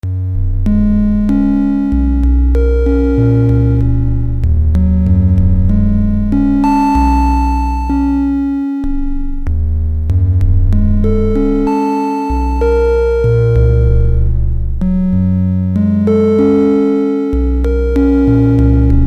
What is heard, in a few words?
Randomizer Synth; Deformer